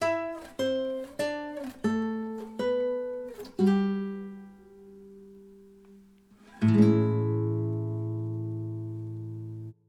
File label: Event Rest; nusakana